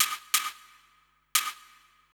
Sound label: Hip Hop R n B; PER LP; USB Soundscan vol.19; AKAI